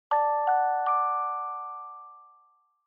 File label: Smartphone UI Sound Speaker